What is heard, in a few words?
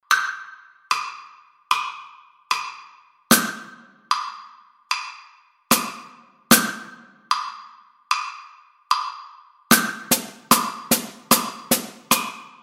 drum set